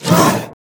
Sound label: Spider Grunt General Scream Start Combined